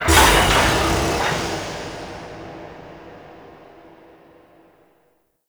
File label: dark machinery air burst; Warframe; Tau